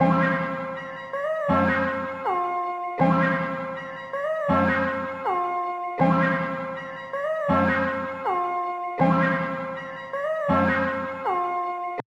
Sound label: SAMPLE 160 BPM